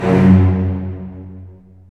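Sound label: STR, strings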